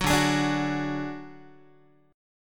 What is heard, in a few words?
D Major Flat 5th D Guitar, chord; strummed; JGuitar